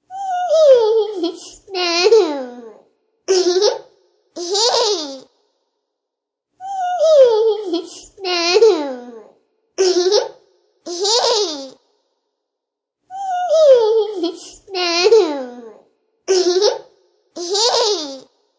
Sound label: Toddler Giggle; Ringtone